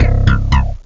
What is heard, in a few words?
mirage bass; OctaMED SoundStudio V1